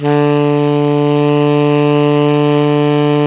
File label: TENOR PP D 3, Saxophones; Greytsounds; Philip Wolfe David Crozier's Saxophones